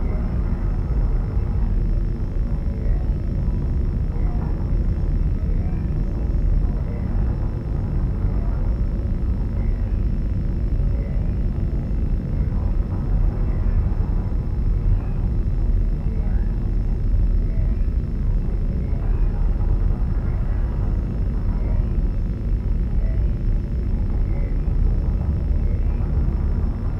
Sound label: ship destroyer idle; Stellaris; shroud; ships